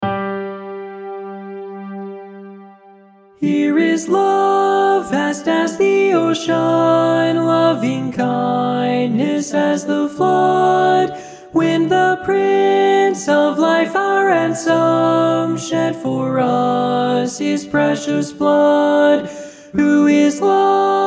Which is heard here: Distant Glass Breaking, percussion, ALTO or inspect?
ALTO